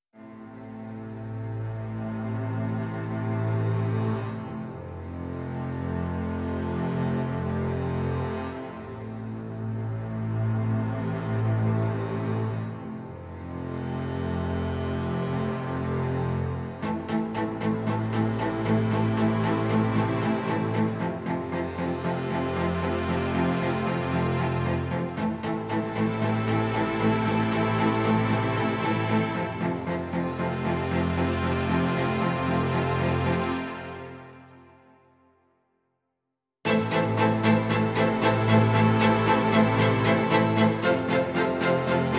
Strings Only Mix

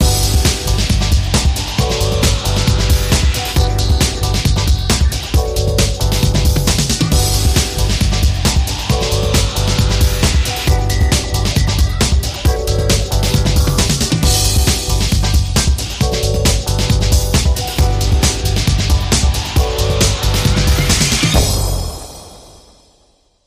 Happy Sweep Bed

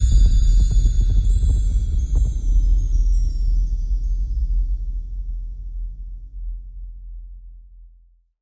Chimes Boom

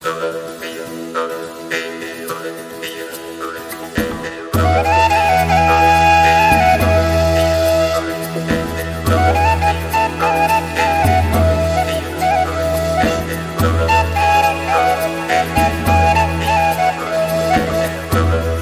Lowland Acoustic

